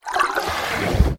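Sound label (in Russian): большой поток воды из крана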